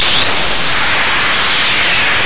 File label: Jet